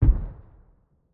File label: f1 grenade explosion distant; Rust